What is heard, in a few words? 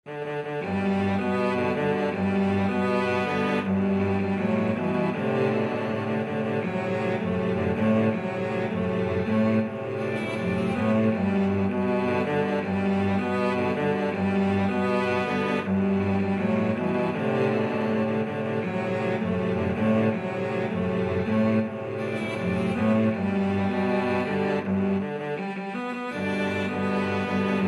cello quartet